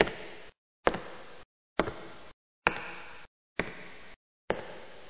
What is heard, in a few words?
Steps